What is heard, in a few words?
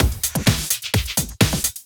Housebeats 128BPM; Vengeance Samples